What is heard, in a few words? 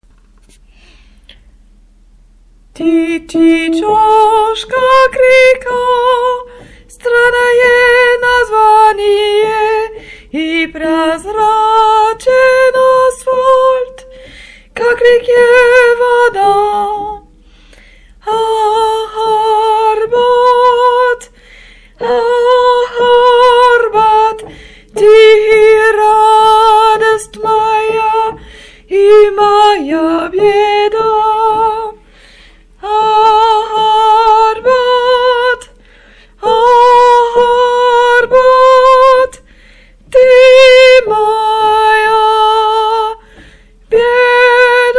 arbat tenor